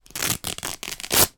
foley cloth rip; sound effects one

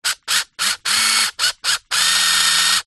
science fiction robot motor server movements

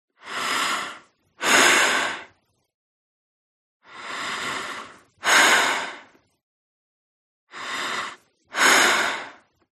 HUMAN BREATH Female Breath Deep; smartsound

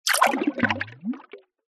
multimedia game designed water movement

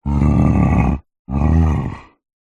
horror yeti monster growl grunt; zapsplat

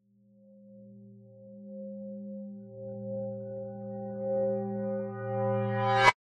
metal chime hit reverse build crescendo; sound design

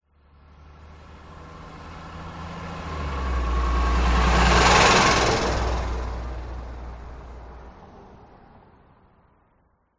truck pass

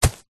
footstep single soccer boot run grass

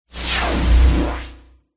magical telekinesis blast; zapsplat

